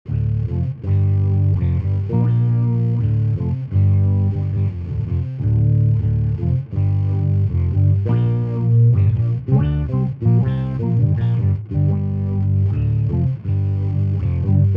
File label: Flying V Effects